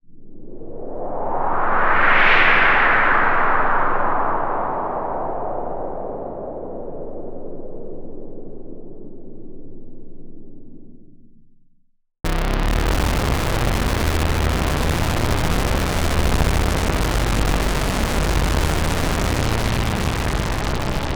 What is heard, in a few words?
Roland MKS-80 FX